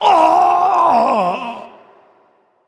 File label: Death; Creature; Bolvar Fordragon